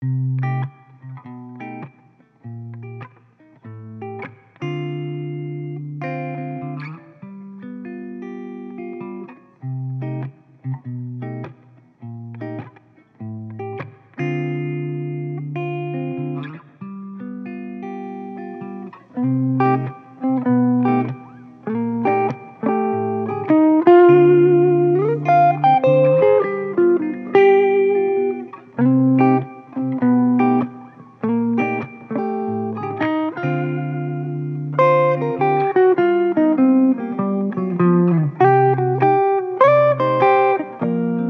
12AX7 bias Center 18v power supply, Handmade tube guitar pedal; green boost